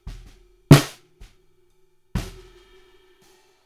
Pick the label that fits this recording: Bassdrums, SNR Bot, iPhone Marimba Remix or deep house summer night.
SNR Bot